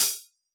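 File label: hi-hat Nat Open, Yamaha DJX; 155 DJX Kit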